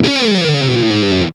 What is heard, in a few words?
HEAVY STABS; East West; Global Sounds